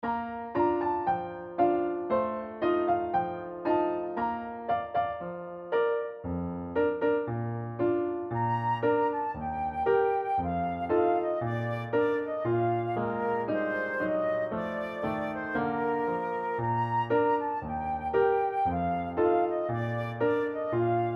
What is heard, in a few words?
practice, band